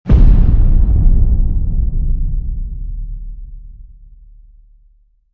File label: low booom trailer song